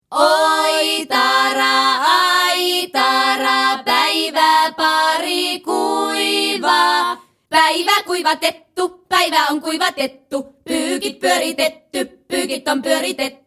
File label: aitara